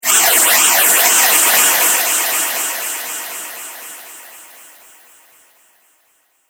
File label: Flyby Fire; Whoosh; Andrew Fleming